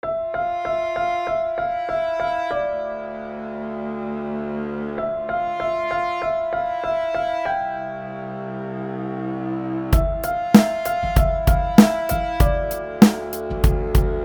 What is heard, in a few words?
violin